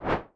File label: weapon melee; adarkroom